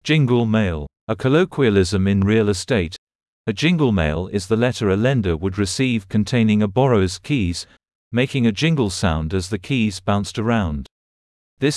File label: Jingle Mail